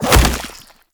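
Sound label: Regurgitate Projectile Impact B; Warframe; Grendel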